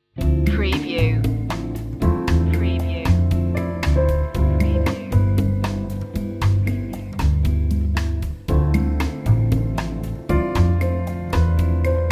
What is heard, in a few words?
moody bossa underscore